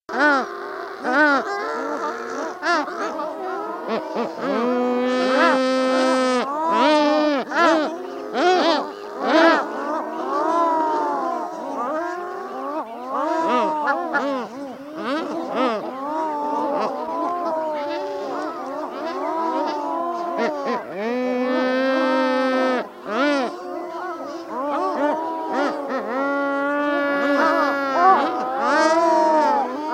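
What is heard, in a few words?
Pallas's gull voice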